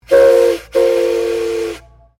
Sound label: Train Steam Engine Whistle Double